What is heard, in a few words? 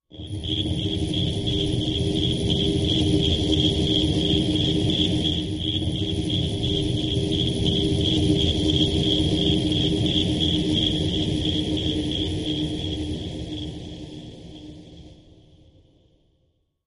Turbo Machine Ele, probes; Turbo Crickets, Machine, Electric Ambience, Cricket Sound